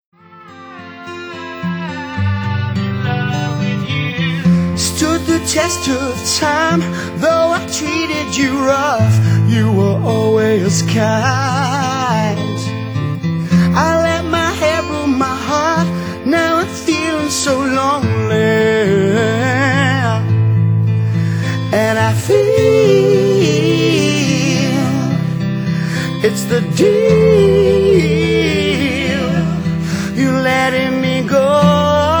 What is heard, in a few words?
Acoustic version